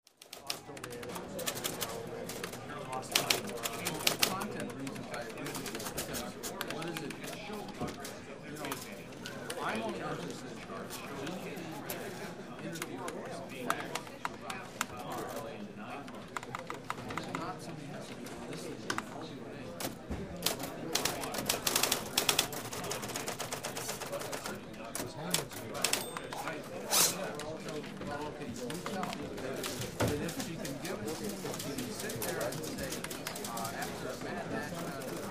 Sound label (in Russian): rabota v ofise pechatnaya mashinka zvuki sredi